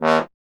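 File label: bass trombone D#; Miroslav Vitous; Solo Instruments VOL-1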